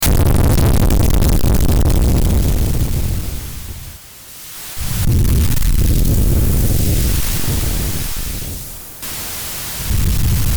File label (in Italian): crepitii